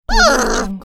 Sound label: talking whine from dog; sound ideas